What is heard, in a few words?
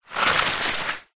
stones and dust handful scoop up from ground; zapsplat; foley